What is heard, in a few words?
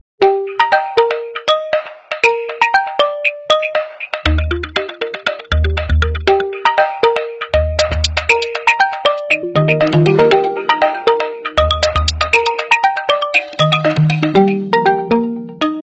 Oppo Ringtone